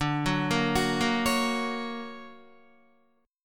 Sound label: D Augmented Major 7th down Guitar; D+M7 arpeggiated; JGuitar